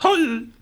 piglette damage; Angry Birds

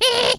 piano g; Angry Birds

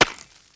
ice light collision; Angry Birds

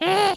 piano dis; Angry Birds

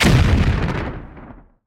tnt box explodes; Angry Birds

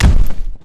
mightyeagle thump; Angry Birds

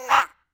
bird collision low; Angry Birds

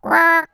piano c; Angry Birds

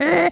piano cis; Angry Birds

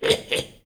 piglette oink; Angry Birds

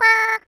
piano f; Angry Birds

